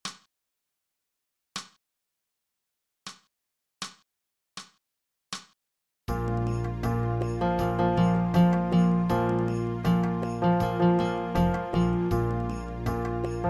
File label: Additive Slurs